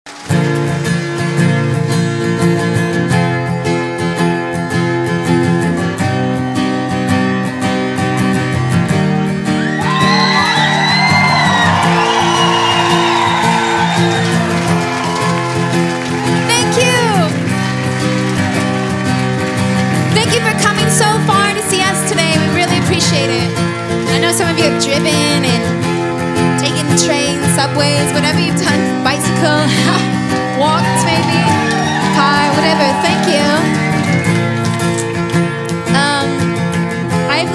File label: Live Acoustic